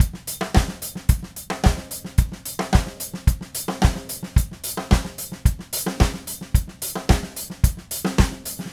Red Maple Groove 110, DW Red Maple Kit

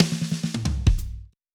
Spenny Fill 89.59 bpm, drums; Basement drums vol 1

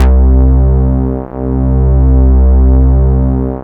PROPH BS C3; Drums In Your FACE!

